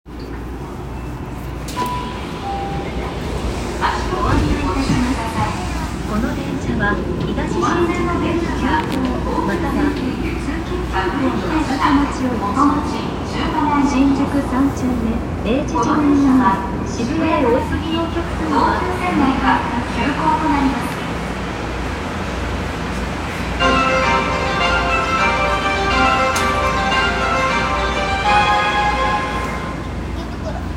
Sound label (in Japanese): 副都心線池袋北南